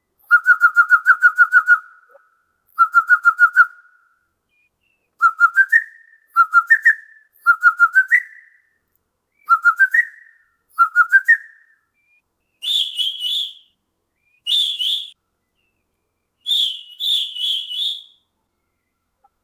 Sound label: bullfinch singing a mixed, chirps